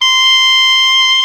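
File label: C6 hard vibrato, oboe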